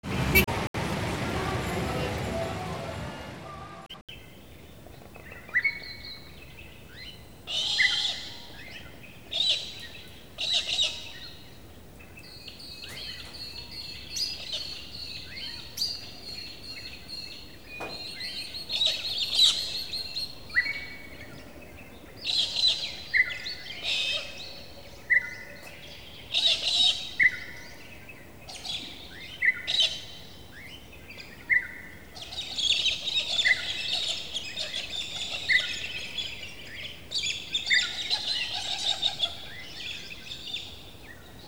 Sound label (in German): Verkehr contra wald